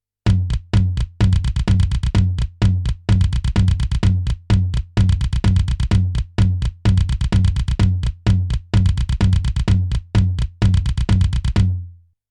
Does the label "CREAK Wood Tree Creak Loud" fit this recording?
No